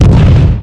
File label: explosions; hl2; impact